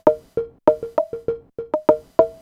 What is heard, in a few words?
attack; Loops recorded mostly with a loop pedal, others made in audacity and software of that ilk